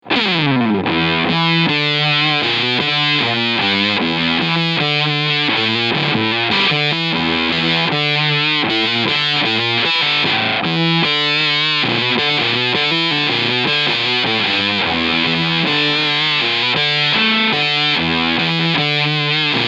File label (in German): MXR Octavio Fuzz Pedal Full Fuzz OHNE Amp Gain